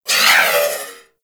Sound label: Missile Tail; Warframe; Weapons; Archwing